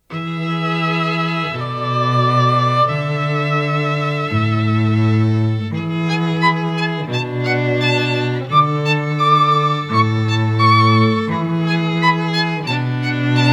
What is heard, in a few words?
Trio, String Trio; Some Audio clips recorded at FTM Studio in Denver Colorado